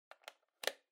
Calculator Battery Cover Close

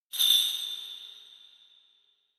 Kagura Suzu

